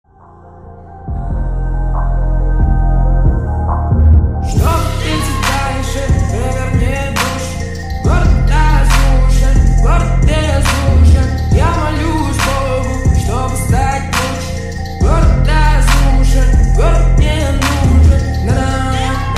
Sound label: slowed reverb, ringtone